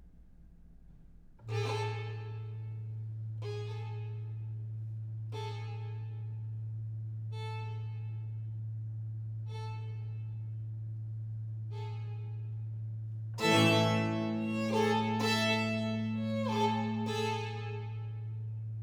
Sonata violino solo representativa, for violin & continuo; Biber; Representatio Avium; A major